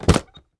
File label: pickup; GrandFantasia